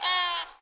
BIRD CALL; Frogger 1997

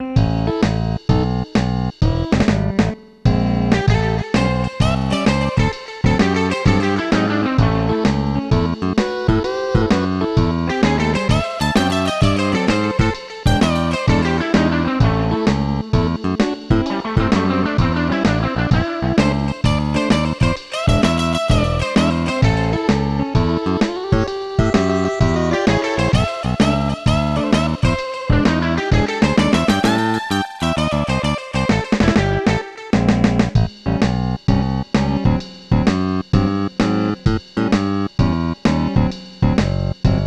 Unused Song; Same Game Mario

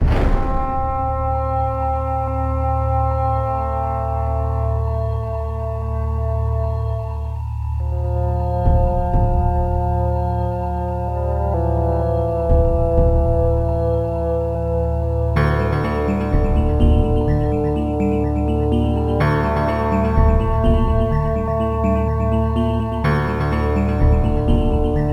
Music; Deus ex; Naval Base

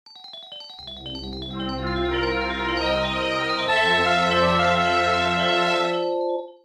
jingle

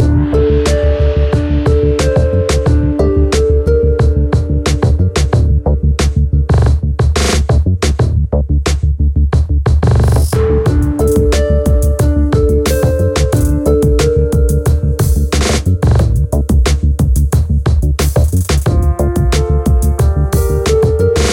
Unused Theme; GB; Sanctum Of Slime

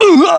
Damage Fly; SSB Brawl; Falco

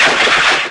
Zoomer; Jak

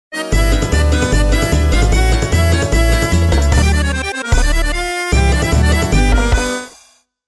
gameover

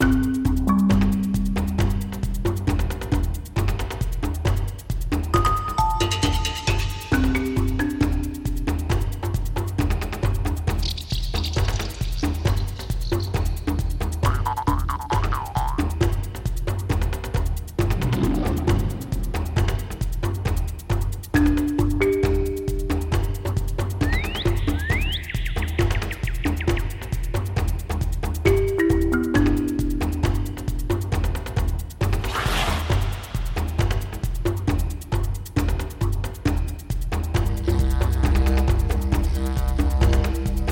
Jungle Rollers

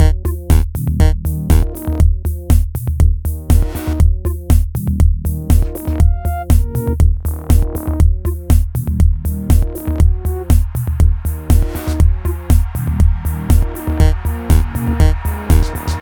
music loop test 08 bars

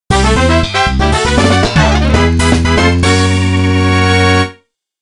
1st Goal; MKDS beta

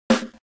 Drum SD; WarioWare Inc

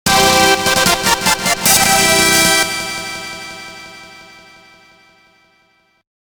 Unused Jingle

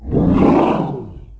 Lurker Death; Jak

